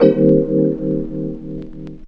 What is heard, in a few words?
Rhodes Chord Stab; Zero-G Jungle Warfare 1, 2 & 3; 90 s Sample CDs